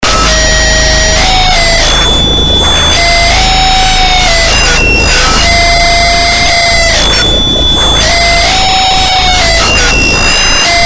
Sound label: depressive noise